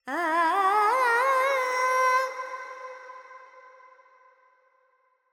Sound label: FX, Vocals, Carnatic Female, Alaps, Charukesi